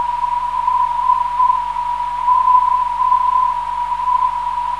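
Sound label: 426kHz, non-directional beacon; SME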